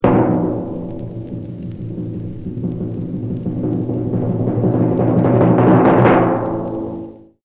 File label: Tympani Roll